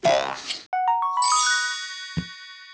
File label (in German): Diplom Freispiel Jingle; Mouse; nds